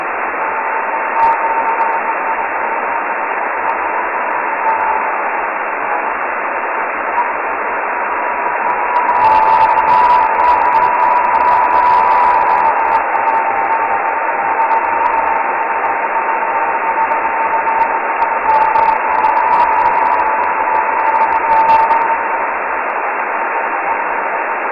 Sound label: geminides radar; 67mhz